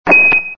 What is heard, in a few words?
ping